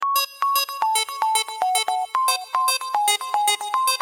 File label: Bip Bip